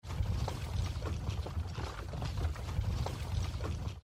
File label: Marching; Age of Empires III; cinematics